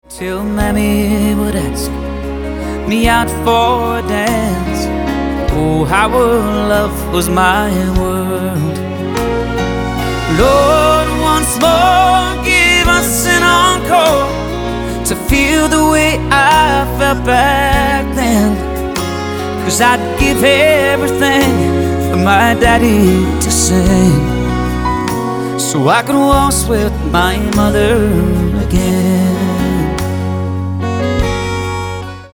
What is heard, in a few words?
waltz